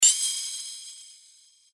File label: Sword Sound; FXHome; Fighting Sounds